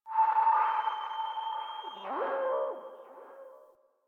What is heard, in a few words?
Venus Landscape Distant Robot Call; Ambience; Warframe; Venus Open World